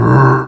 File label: sun super jump; phraxathity